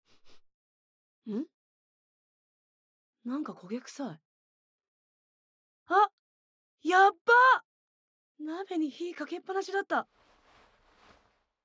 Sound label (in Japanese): フェラ クンニ パンパン立ち正常位; フェラしてるだけで唇がイっちゃう？キッチンのテーブルの上で…クンニからのパンパン立ち正常位！